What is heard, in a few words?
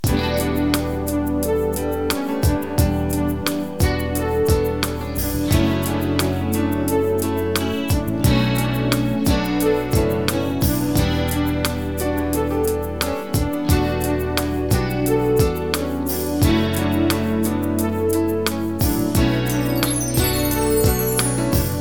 GROOVE